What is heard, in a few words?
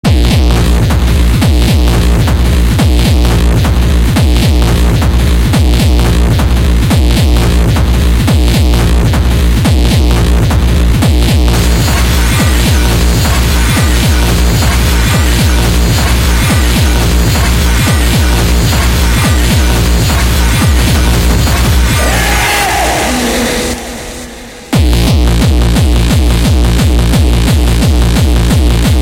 Mega Hard Techno